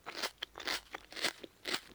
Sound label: eat